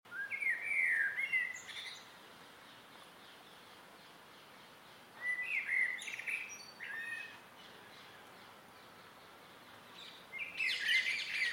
evening garden birds including close blackbird and parakeets